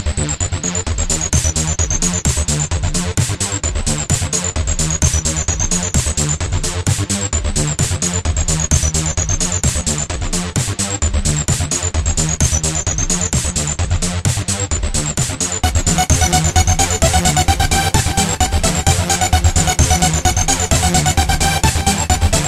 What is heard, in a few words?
Fast beat